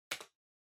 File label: PC Keyboard Far Enter Mid